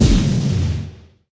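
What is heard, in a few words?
explosion small; AssaultCube; Alien Stars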